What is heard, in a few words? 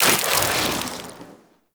Boomerang Melee Throw; Infested